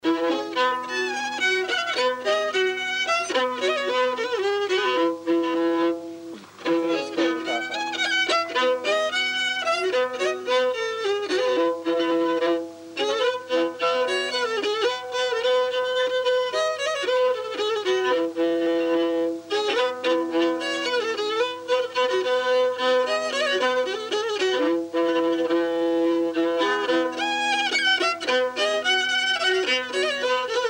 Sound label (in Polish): vals